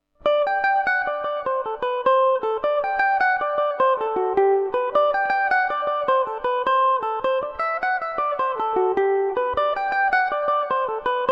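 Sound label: G major revelation, Irish mandolin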